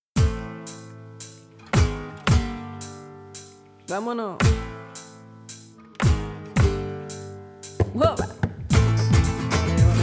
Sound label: Andalusian Mood